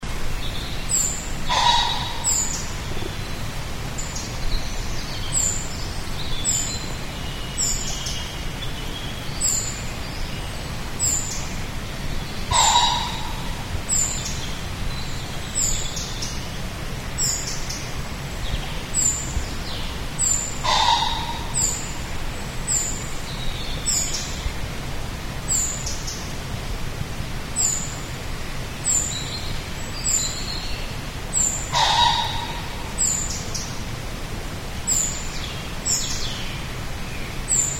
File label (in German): Fasan